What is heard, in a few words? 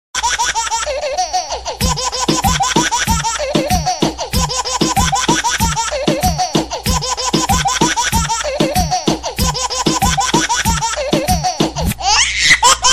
baby remix ringtone, funny ringtones